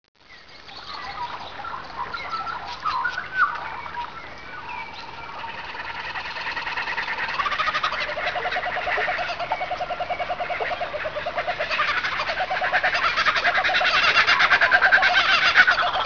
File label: rainforest